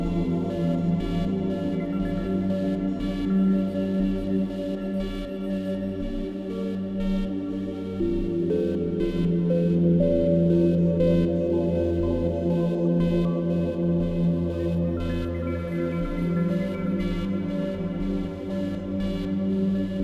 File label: data packet loop